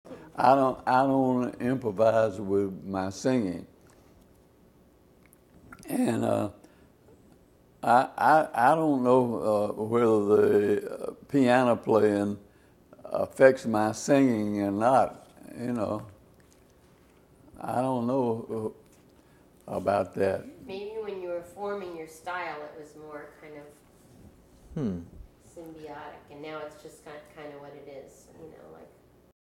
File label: singing; jazz